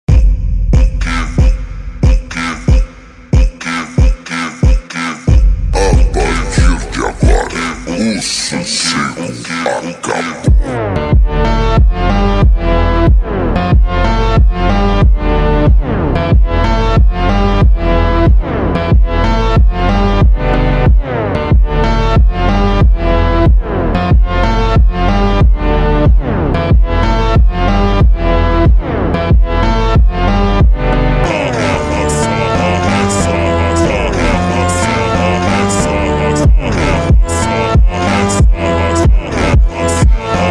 Funk Slowed

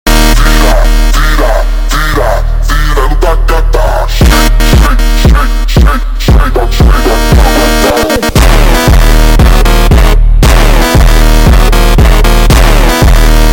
Funk slowed

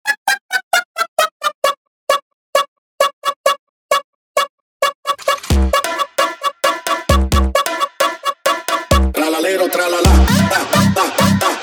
Funk sped Up

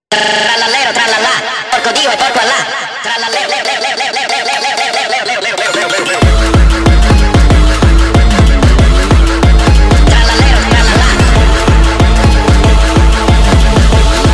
phonk Sped Up

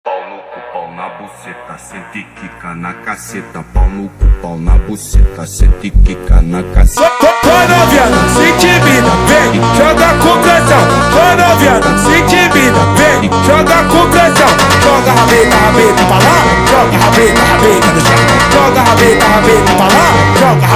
Funk

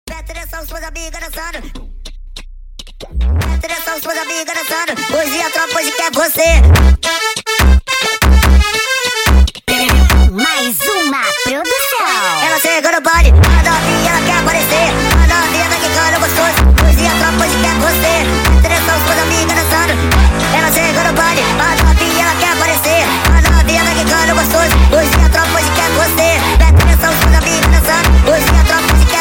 Funk sped Up

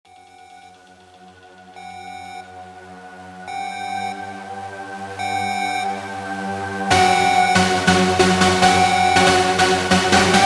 bassboost

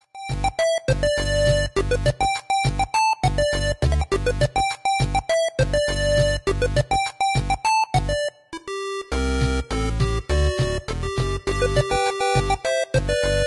8-bit, ringtones; the lion king; Disney

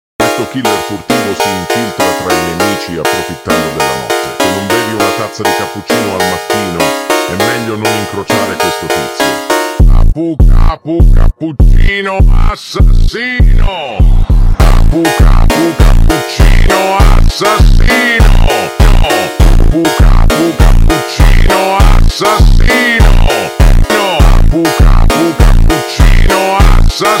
Funk over Slowed

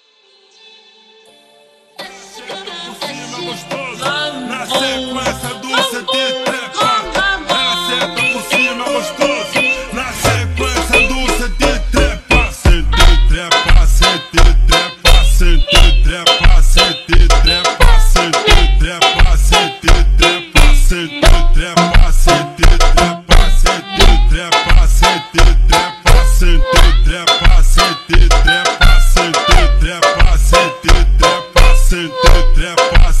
Mambo Funk extreme Slowed

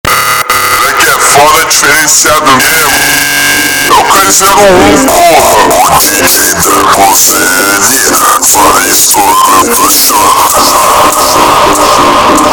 meowsynth Super Slowed

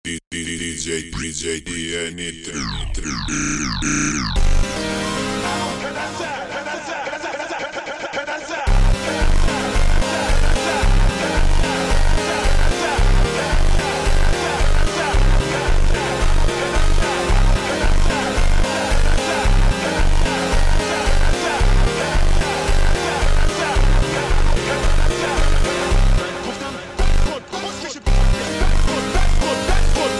Funk slowed

